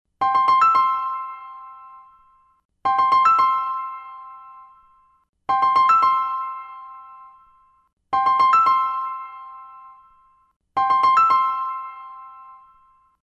jingle; HTC T-Mobile Dash WM6Beta

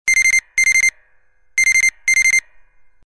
Tight Tone, alarms; Coolpad ESurfing-No1-2021

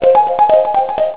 Pop, Cisco Unified IP Phone 7945G

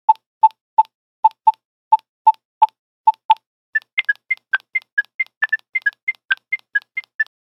Nokia Buzzer 9210 Carioca; Windows Phone apps

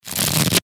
roulette

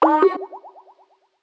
authorisation request received; Netgear SPH200W Skype WiFi Phone

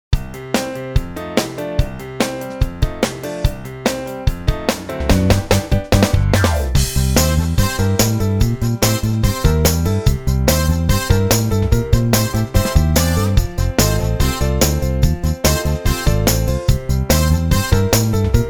Big Band, ringtones; WAO

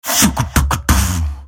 Beatbox; PlusFourSix; Sania

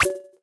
Message Sending; Xiaomi MIUI-9

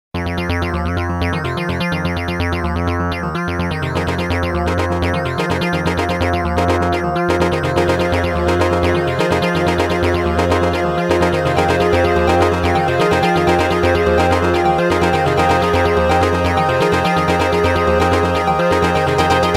Excitement, Nokia Tones

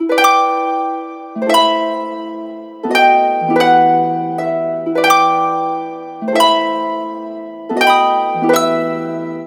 Music Box Mono, ringtones; Sagem

